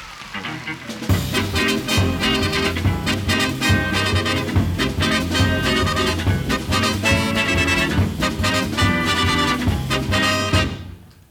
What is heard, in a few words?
Instrumental Bridge